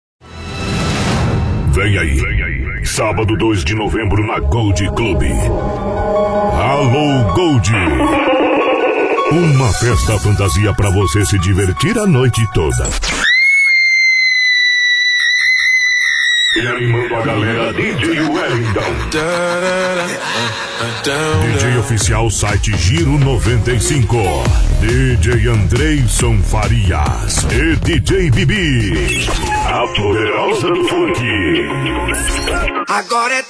Funk

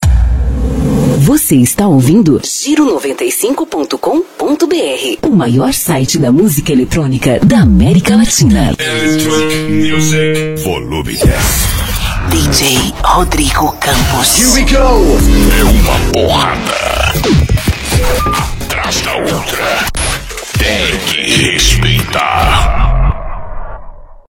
Electronic Music

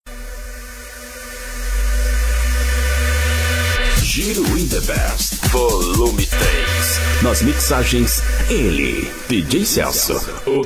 In The Bass

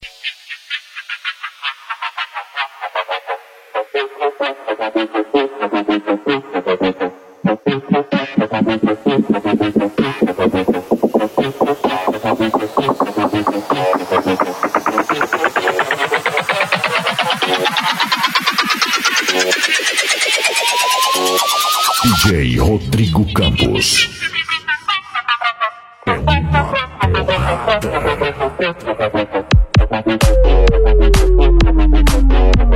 Electronic Music

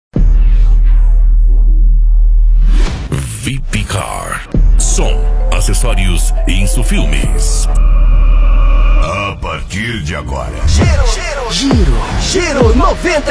MidHouse; Vip Car; Sinop MT

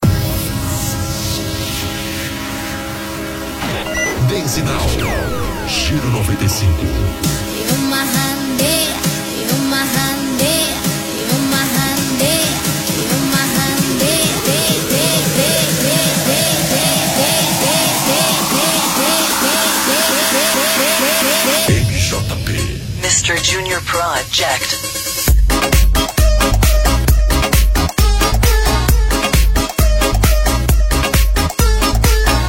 Dance Now Especial Romenian Dance

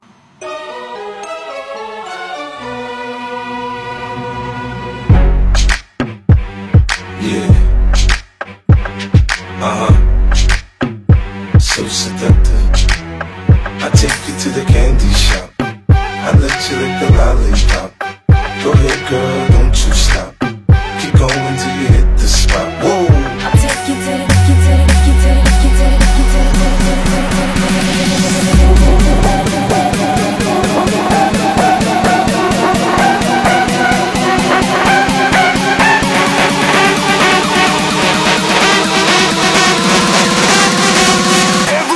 Electro

